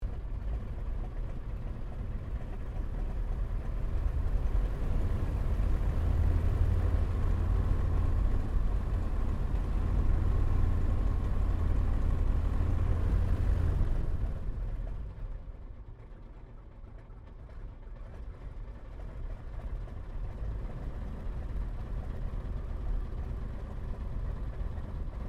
1916 SOPWITH PUP REPLICA TAXI INTERIOR; Replica of a 1916 Sopwith Pup; Airplanes; Vehicles

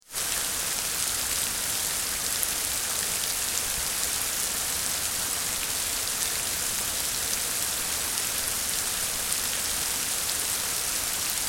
Rain Medium Heavy and Splashy; Rain Sound Effects

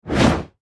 Production Element Whoosh Reverse Fast; Whooshes; Sound Design

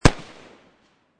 M40 Sniper Rifle Bridge; Rifles; Guns; VRSonic

